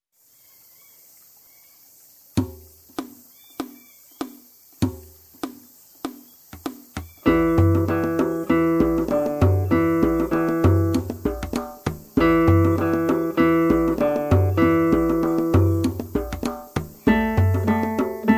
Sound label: Jungle